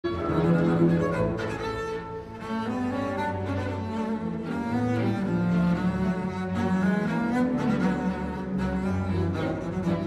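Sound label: Concerto, double bass; Bottesini; live 2002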